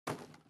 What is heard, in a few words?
Wood Furniture Impact Soft